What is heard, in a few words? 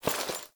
Body Move; Frumentarius; Warframe; Ambience; Nineteen Ninety Nine